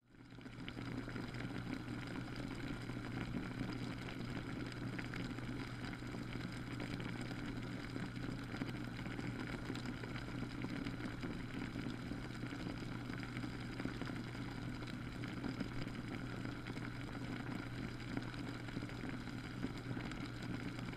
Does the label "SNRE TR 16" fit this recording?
No